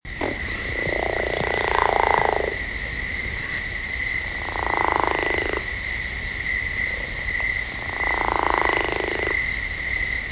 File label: Chiricahua Leopard Frog; Carlos Davidson